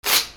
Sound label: PAPER